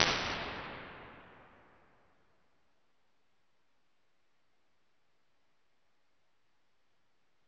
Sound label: Plates Long Hall Plate; Impulse Responses; Ableton Live 12 Lite